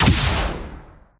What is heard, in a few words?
Gunartl Rocket Launcher Fire